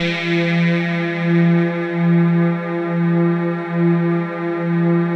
ATMO PADS; Best Service; XX Large

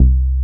BASS

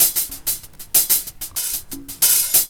LOOP; E-Lab; X Static Goldmine 2; akai

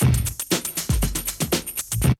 D3 BREAK

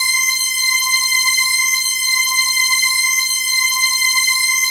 PHASE PAD, ATMO PADS; Best Service; XX Large

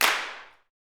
CLAPS, Gospel; Spectrasonics; Vocal Planet Vol.1 Gospel; SNAP

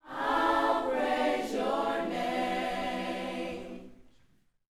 YOUTH CH PHRASE, Vocal Planet Gospel

